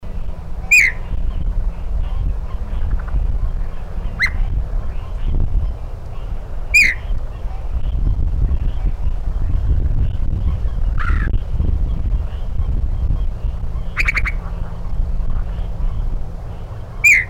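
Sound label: Yellow-breasted Chat American Crow Imitation; Worcester MD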